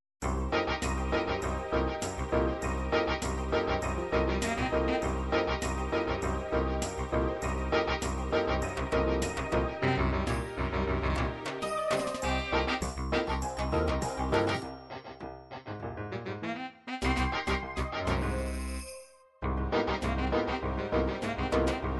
techno, midi

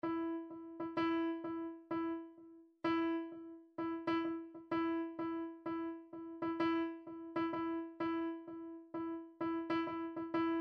percussion midi ride cymbals 064; Stylus; Swizzler

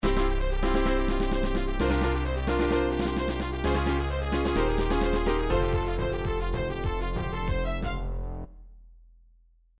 fanfare; super nintendo donkey kong country 2

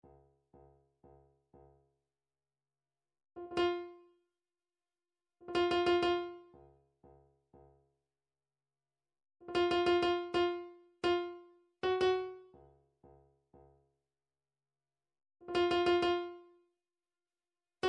drum trax timb

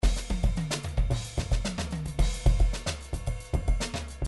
midi drums; Bruford Midi Drums

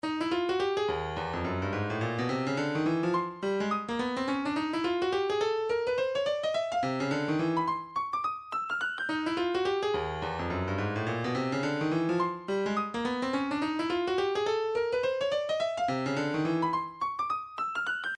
109 fx loop

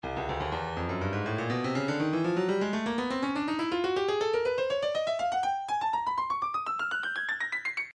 breakbeat 122 bpm; Motown Jam C; Stylus Breakbeat Midi Files

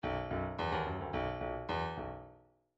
bdf midi file grooves rock d rock d

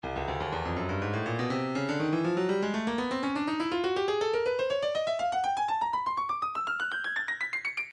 breakbeat 122 bpm motown jam; Motown Jam D; Stylus Breakbeat Midi Files

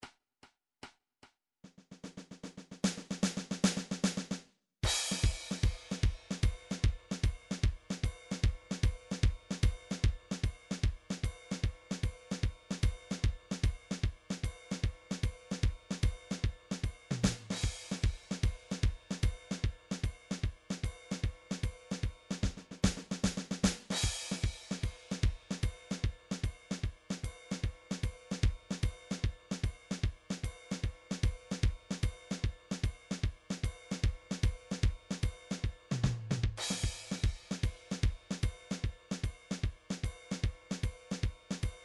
blues

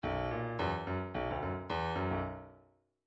midi grooves funk

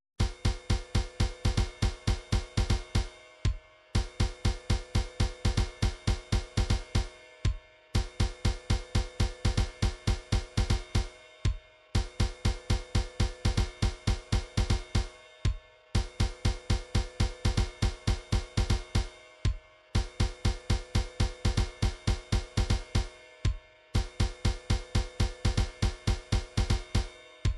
drums, midi drums

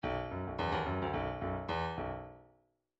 midi file grooves rock

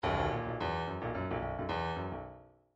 bdf midi file grooves funk t funk t

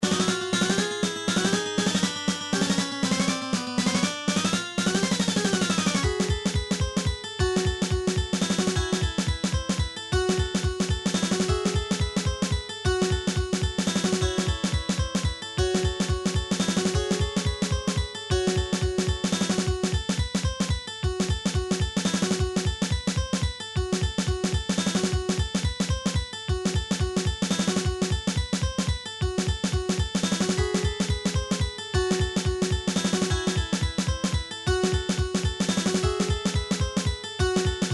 robot master battle; Gameboy; Mega Man 2

